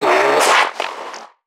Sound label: NPC Creatures Vocalisations Infected; soma; npc construct; Five Nights At Akers